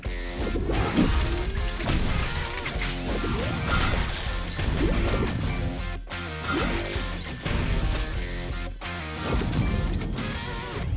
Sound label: Impact Mine; Disney Infinity